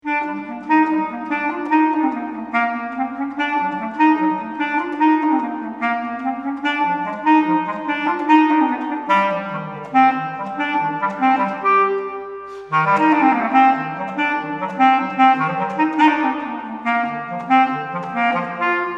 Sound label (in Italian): CLARINETTO BASSO